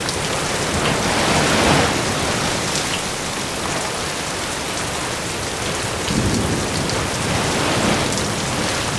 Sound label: wind rainy loop; Enviro 3 - Additional Weather Pack; NBSky